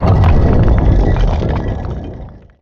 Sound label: gear